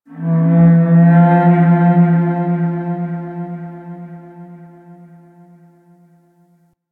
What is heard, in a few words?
Ocean Whale Bellow; Warframe; Grineer Ocean